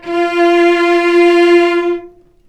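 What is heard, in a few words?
cellos attack F4 L, String; East West; The Ultimate String Collection; VCS ARCO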